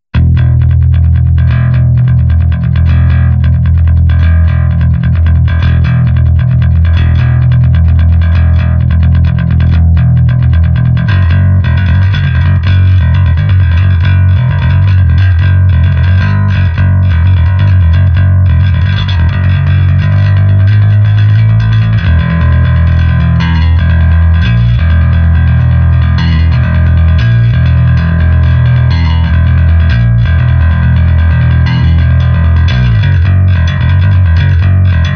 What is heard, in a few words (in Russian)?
бас; Второй-третий план